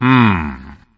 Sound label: hmm; Luigi